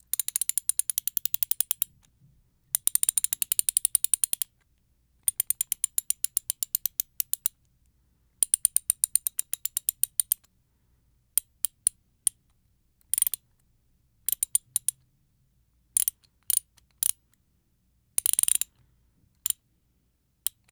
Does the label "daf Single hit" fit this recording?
No